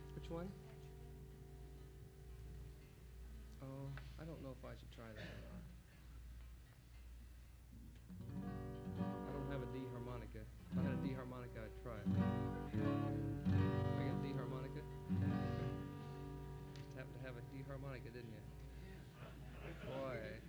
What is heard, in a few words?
Applause